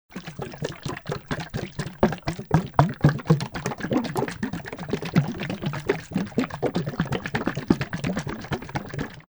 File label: paint tin shaking